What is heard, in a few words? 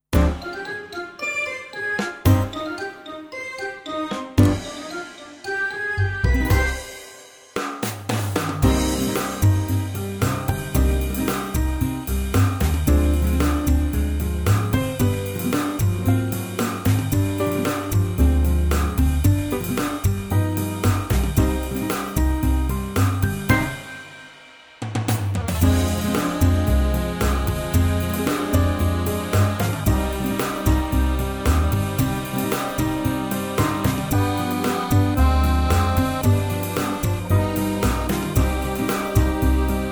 GUITAR